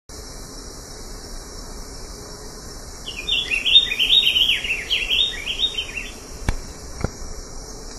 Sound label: Stephanophorus diadematus; PN El Palmar; Jorge La Grotteria